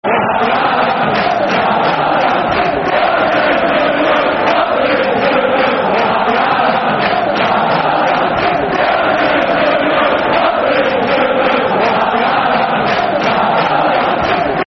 Football Chants; Larsson Is A Blue Nose; Birmingham City Bcfc

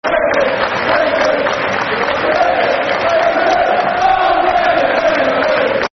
Football Chants (Soccer Songs); We Love You Reading; Reading Rfc

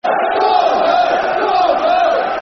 Football Chant (Soccer Songs); Come On Vale; Port Vale Pvfc

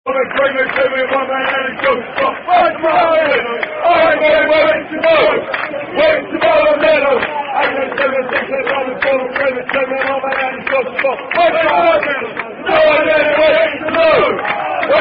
Ten Men Went To Mow

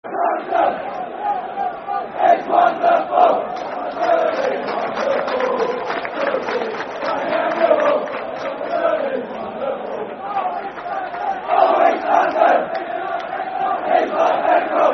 Oh South London Football Chant (Soccer Song); Millwall Mfc

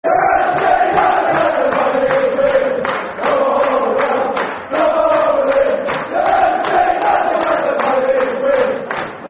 Football Chant (Soccer Song), Sunderland; Sunderland Safc

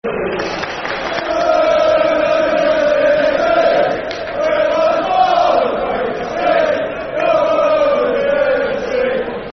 Chelsea F.c., Football Chants (Soccer Song)

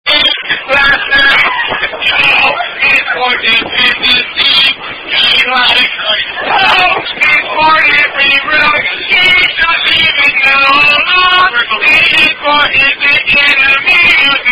Hash Song; Onniversary Hash

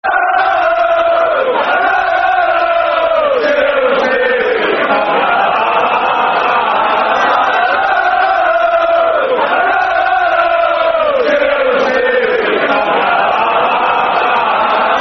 La La La Chelsea, Football Chants (Soccer Song)

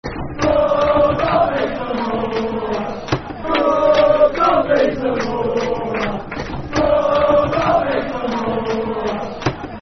Football Chant (Soccer Songs), Fulham; Bobby Zamora; Fulham Ffc

